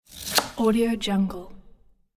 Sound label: knife chop; ambientsfx